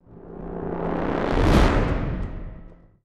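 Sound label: sting, strings hit; transformers war for cybertron gamerip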